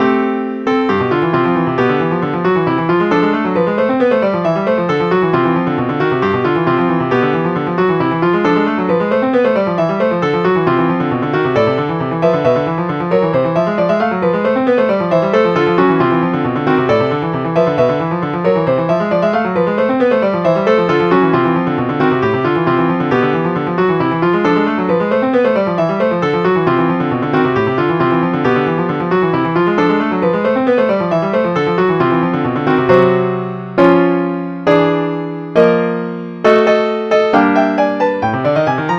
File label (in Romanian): muzica clacica